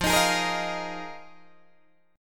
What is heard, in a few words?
F Minor 11th, Guitar DADGAD